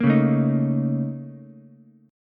Bb Minor Major 11th Bb, Ukulele; BbmM11; strummed; JGuitar

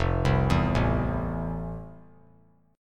F Major 7th F; arpeggiated; JGuitar

